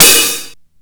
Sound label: CYBER KIT, DRUMS